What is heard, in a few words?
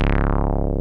TB303, Synth Basses; E-MU Formula 4000 Series Vol. 2